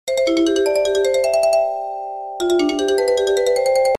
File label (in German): Sony Ericsson, klingelton